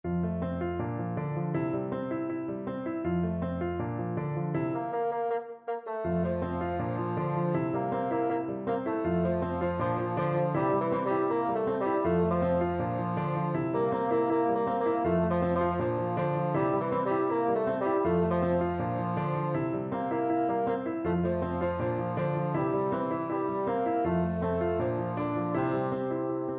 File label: la bamba, bassoon